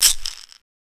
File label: Perc Shaker